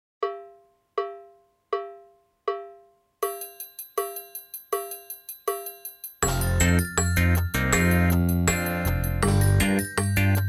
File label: without drums; Drum Kit exam